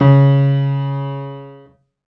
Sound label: Piano, Harmonic